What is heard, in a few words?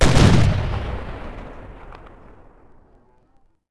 Six Shot Fire; Civ4 warlords